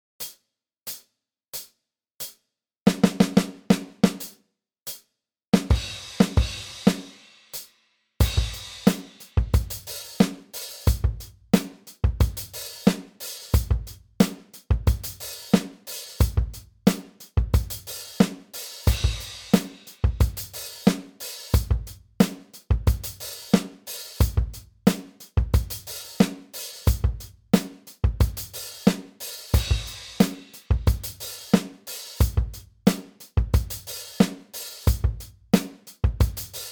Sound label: Intro 90bpm